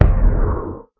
elder hit, guardian; minecraft; mob

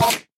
hit, endermen; ayuncraft-1.5

